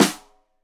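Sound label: Snare Stereo, Drums; Deeply Sampled Drums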